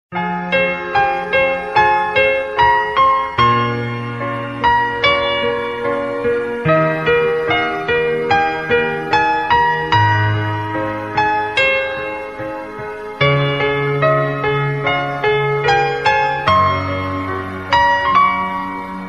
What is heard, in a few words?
romantic piano ringtone